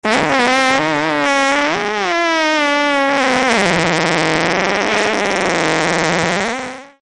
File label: Fart Alarm